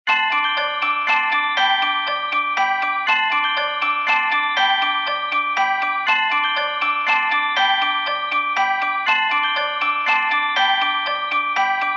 Ice Cream Truck; Simp Hit and Run

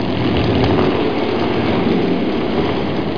frog slide; Frogger 1997

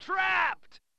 Trapped!; Kirby's Ghost Trap

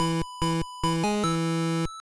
unused Pokemon healed theme; Pokemon FireRed LeafGreen

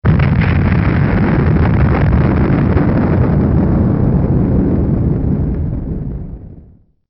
Player Explosion; Blast Chamber PSX Final US

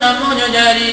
chant; OOT3D

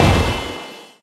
spell hit

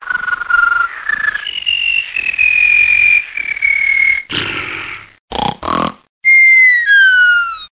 bird snore; Candyadv

